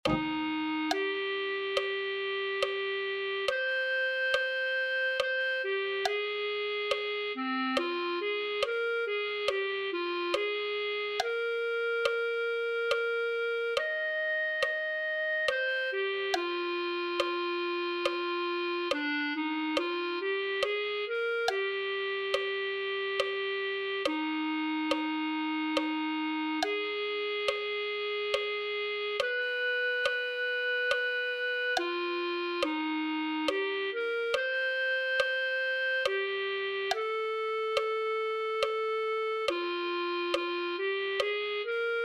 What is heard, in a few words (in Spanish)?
clarinete